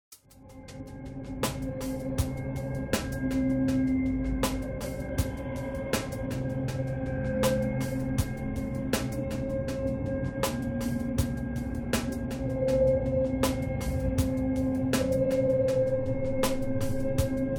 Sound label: Texture Hiphop Urban Grinding Nasty; LYN115 Trailer Production Elements